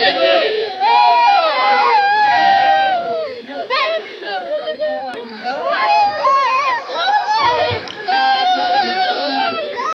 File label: joyful indistinct voices, young people